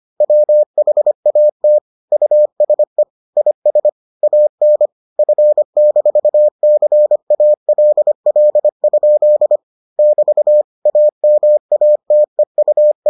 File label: morse